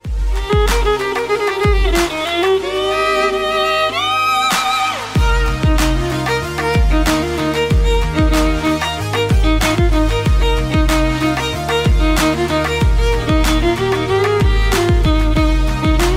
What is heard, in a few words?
Violin; meloboom